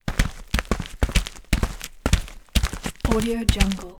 footsteps group small grass army soldier troops; empire game

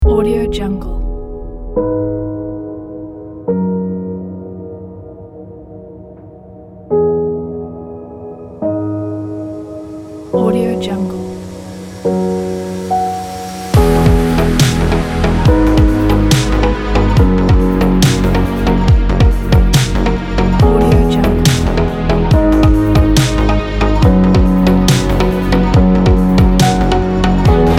abstract soundscape inspiration kit

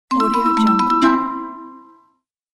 ancient mission completed ding

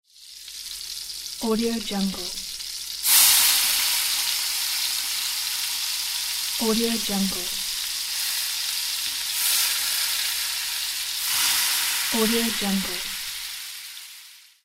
sizzle meat

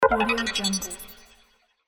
futuristic ammo grab

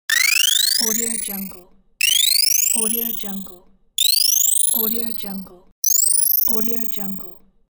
interface riser; soundprofi

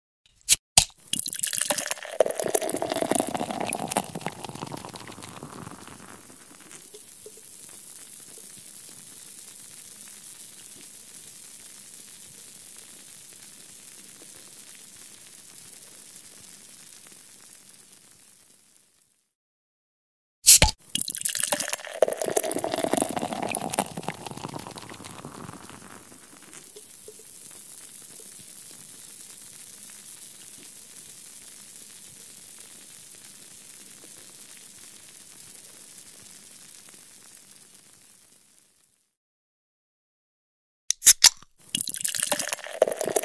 beer can opening and pouring; smart-sound

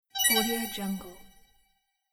user interface sfx message

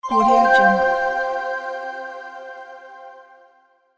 corporate presentation title

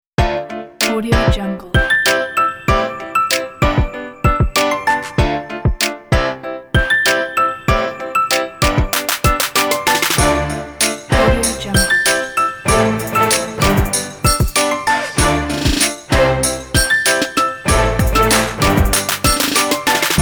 happy hip hop

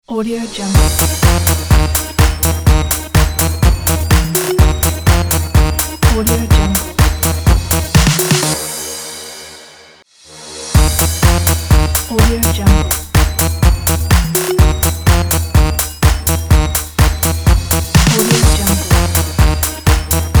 spooky scary fun logo

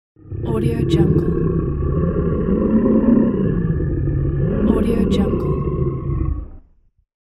animal roar

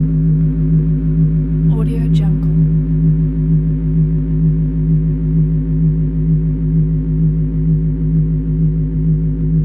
hum

